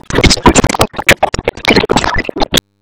Sphere Detonate; Warframe; Gyre

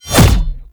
Bayonet Melee Combo; Warframe; Tenno

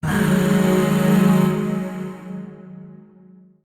Shawzin Yo Chord; Warframe; Zariman; Void Shawzin; Chords

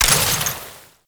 Ammo Pickup Gas; Ammo Ability; Warframe; Warframes; Frumentarius

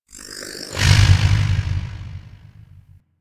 Corruption Fracture Arms; Warframe; Missions; Zariman

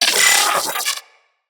Arachnoid Micro Vocal Pain; Enemies; Corpus

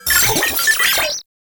Sniper Drone Vocal; Enemies; Warframe; Lasrian

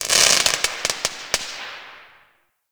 Firework Crackle; Warframe; Fireworks

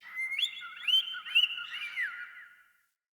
Day Bird

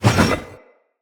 Hammer Whoosh; Weapons; Enemy; Blunt; Hammer And Shield Weapon

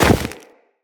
Run Deep Snow, Footsteps; Characters; Operator

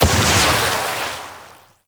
Aqua Board Jump Large; Warframe; Yareli

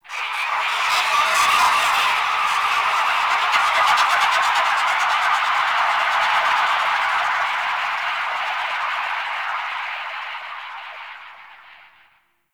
Possessed Texture; Ambience; Warframe; Shadowgrapher

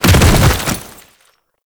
Bodyfall; Warframe; Lasrian Soldiers; Foley

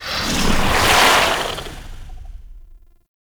Beam Start; Warframe; Entrati; Conquest; Vampyric Liminus

